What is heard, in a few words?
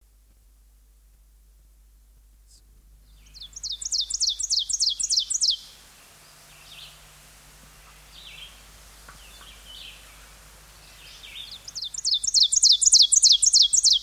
ovenbird, songbirds